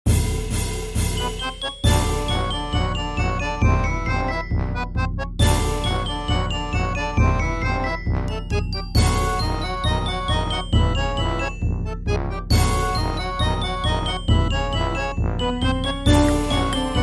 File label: slippery slide alt mix